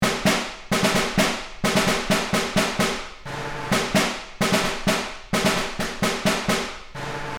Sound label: Field drum marching loop